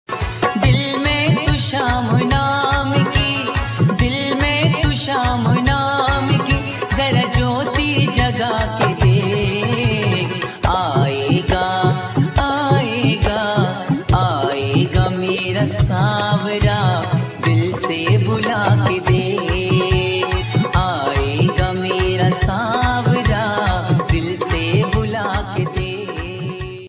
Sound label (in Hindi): Khatu Shyam Ji Ringtone shyam baba bhajan